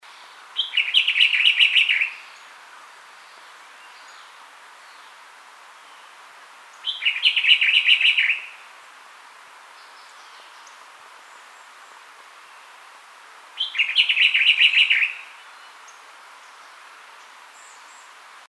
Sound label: blue-grey robin